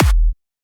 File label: Kick; LiLA'c Records PsyTrance Sounds 01